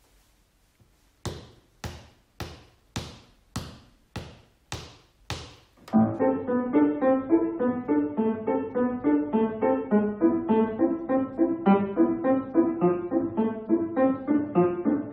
Piano Duet Exam Speed; Grade 3; The Teacher part

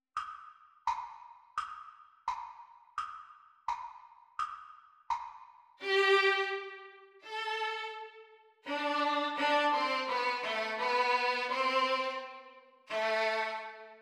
Violin Duet Exam Speed

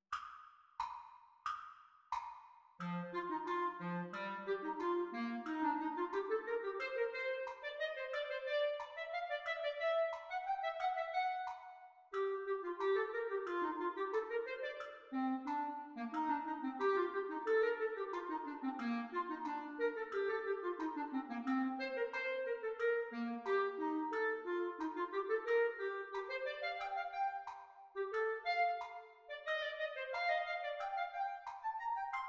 Exam Speed Recording Clarinet Duets; Grade 8; The Teacher part

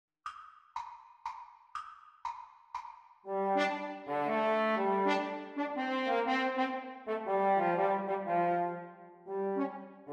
French Horn Duets Playalong

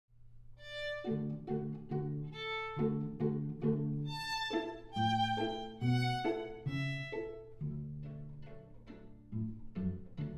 without violin or cello

